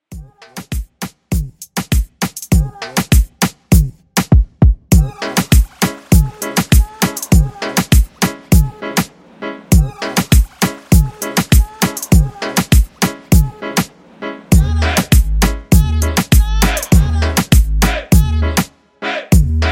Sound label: Moomba; Quick Reboot